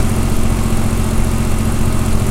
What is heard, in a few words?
spitfire cockpit r, engines; War Thunder